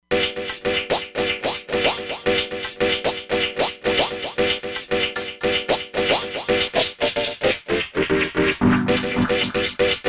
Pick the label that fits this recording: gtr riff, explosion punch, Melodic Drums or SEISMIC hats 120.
Melodic Drums